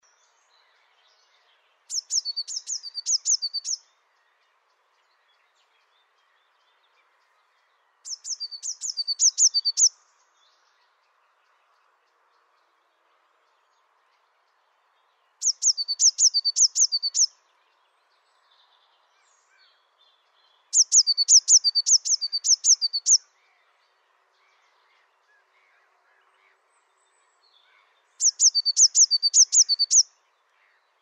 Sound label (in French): MESANGE BLEUE DOMBES